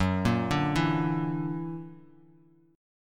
F sharp Augmented 7th, Bass Standard; F#+7 arpeggiated; 14,13,12,9

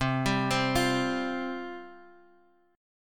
C Suspended 4th C

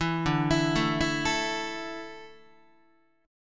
D Suspended 2nd D, down, Guitar; 7,10,7,7,8,8; arpeggiated

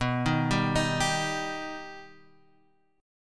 B Augmented B Guitar Half Step Down, chord

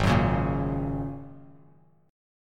Ab 9th Suspended 4th Ab down Bass Standard; Ab9sus4 strummed; JGuitar

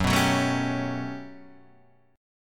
G Major Sharp 11th Gb, chord

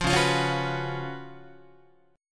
C sharp 11th Guitar, C#11 chord; strummed; JGuitar

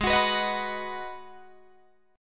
G sharp Minor 7th G sharp, Guitar Open D

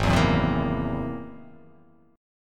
G sharp Augmented 9th G sharp

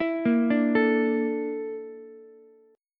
Bb Major 7th Flat 5th Bb Ukulele; BbM7b5 arpeggiated; JGuitar

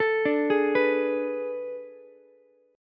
A Major 7th Suspended 2nd Suspended 4th, Ukulele; AM7sus2sus4; arpeggiated; JGuitar

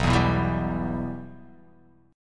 B Suspended 4th Sharp 5th B; Bsus4#5 strummed; JGuitar

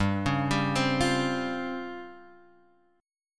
Db Major 7th Flat 5th, G, guitar

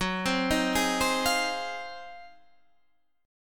Ab 13th Gb

